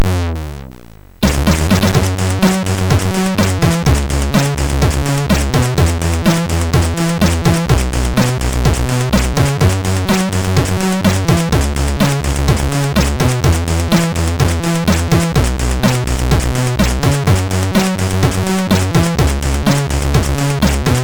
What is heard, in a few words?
Little bass, MOS6581R3